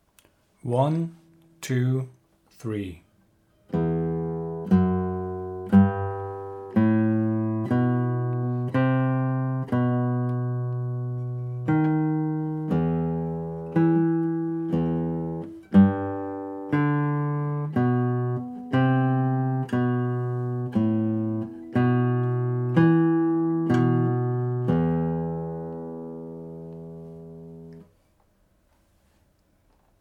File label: 60bpm, guitar